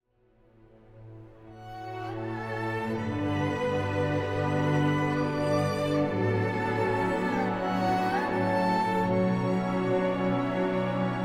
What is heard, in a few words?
Orchestral Sample